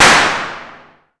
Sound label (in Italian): petardo